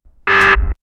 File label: dull thud sound unsuccess